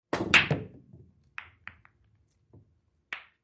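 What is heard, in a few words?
Pool Game Billiard Balls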